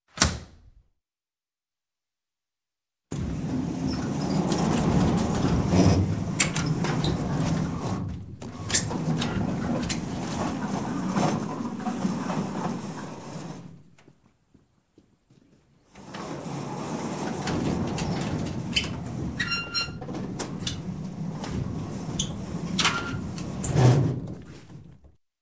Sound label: Door, Metal Close Door, Metal Large Barn Door; Sound Ideas 2000 Series